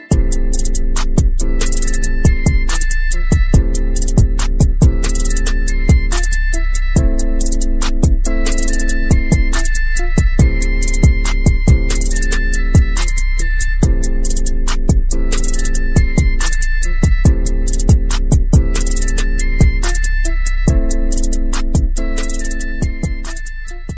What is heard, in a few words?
english movie theme ringtone